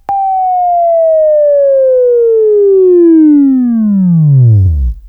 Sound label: Buzz